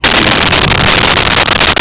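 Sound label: voice growl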